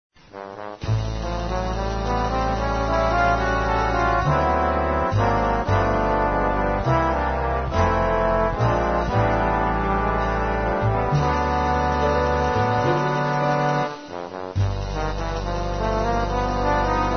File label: trombones